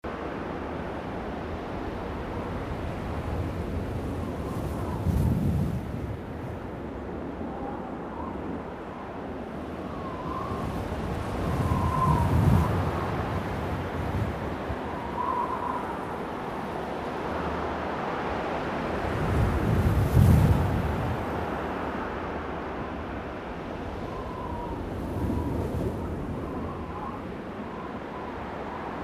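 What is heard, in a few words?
WIND Summit Gusts Soft loop; gamerip; The Unfinished Swan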